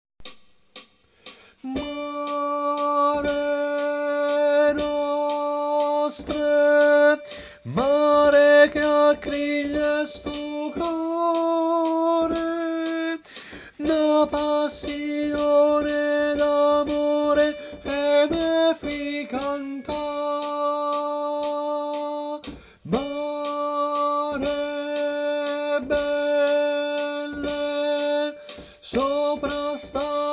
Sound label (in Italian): Tenori, Coro; Coro Isola del Giglio; Mare nostre